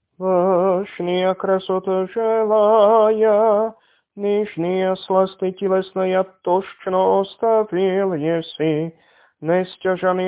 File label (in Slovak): Kondák bláznovi pre Christa hlas 8; nedelna-skola